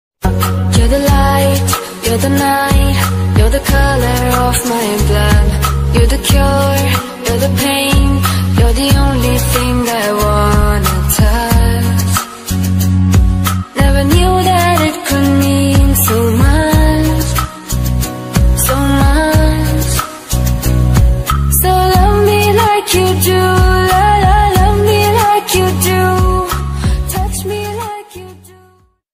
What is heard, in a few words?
ringtone song latest english ringtone sad ringtone